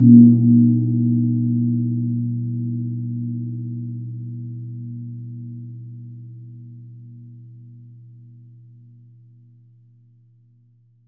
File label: GONG A#2; USB Soundscan vol.60; Asian Traditions; AKAI